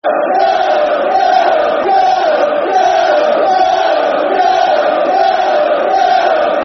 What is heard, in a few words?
Yellows, Yellows, Football Chant (Soccer Song); Norwich City; Norwich City Ncfc